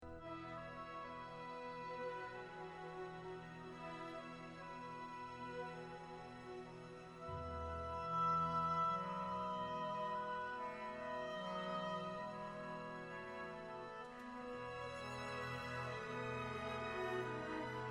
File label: English Horn; I - Allegro Maestoso; London Symphony Orchestra; Mahler Symphony No. 2